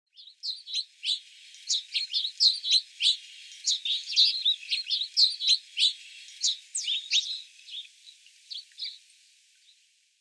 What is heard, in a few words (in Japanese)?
hagimashiko, kochi, koe